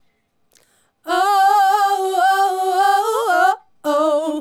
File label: Female Group, Hip-Hop and RnB Vocals